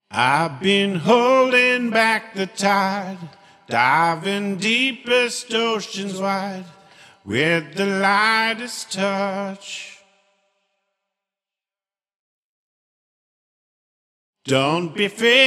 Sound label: pella